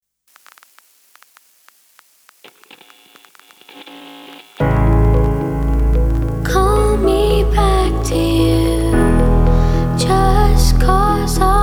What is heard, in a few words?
Bells Whistles